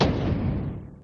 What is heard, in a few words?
door lock; WILLIAMS; INDIANA JONES; Indiana Jones Original